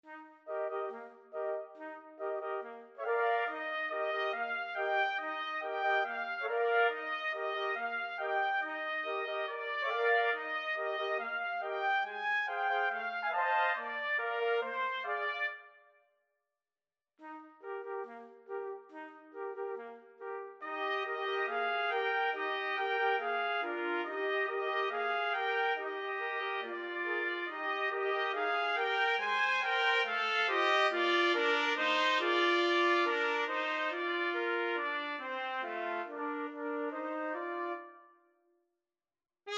trumpet ensemble

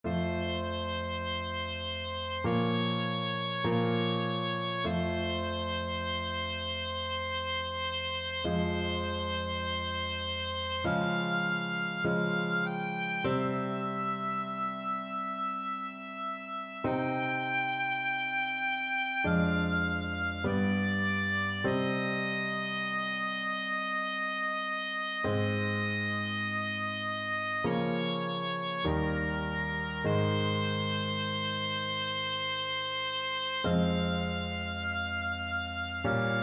purcell queen, oboe

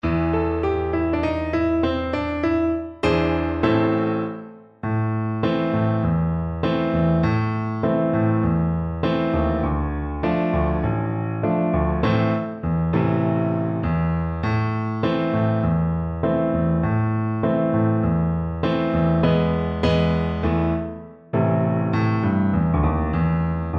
Oboe

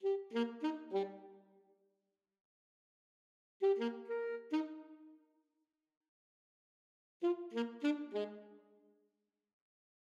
skip to my lou alto saxophone duet

